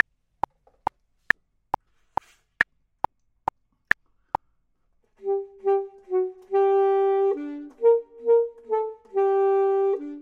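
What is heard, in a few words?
top, Saxophone and Clarinet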